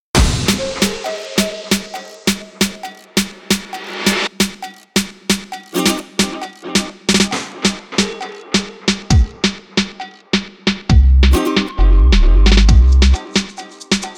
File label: Latin Beat Party; Mustache Mondays; Original Sound Track